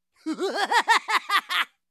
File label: laugh; twisted metal